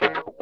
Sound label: wah snip, Funk Gtr & Wah